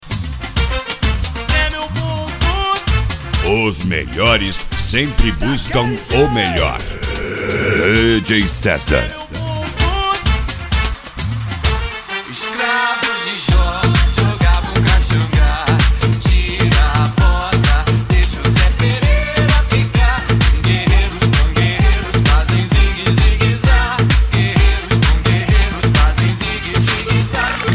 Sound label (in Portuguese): sound car carnaval